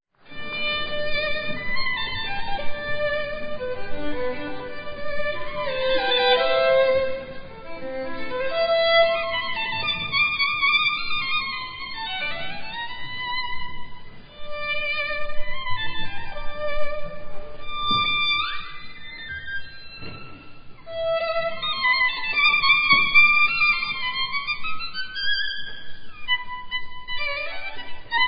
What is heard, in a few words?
jingle bells